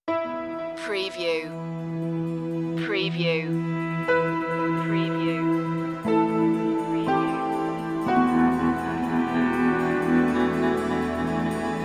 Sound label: underworld series dramatic cue